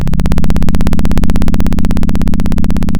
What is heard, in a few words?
Sound of a plane taking off